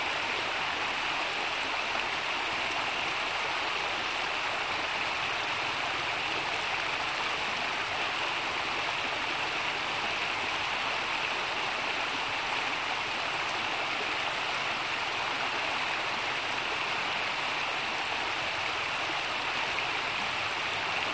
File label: river stream